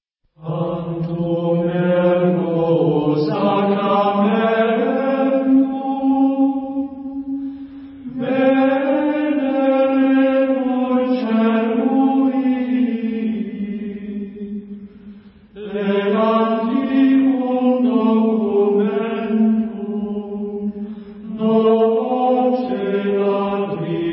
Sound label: Tantum ergo Gregorian Chants; 古典（格列高利）